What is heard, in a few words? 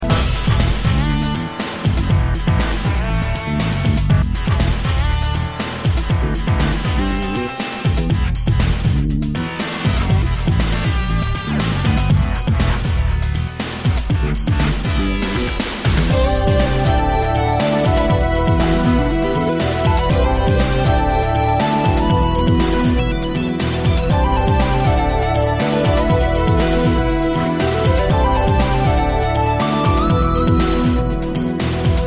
Jazz Menu; batman arkham origins; android ios